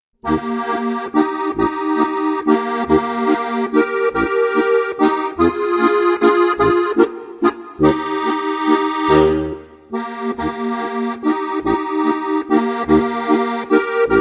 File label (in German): Jagdhornwalzer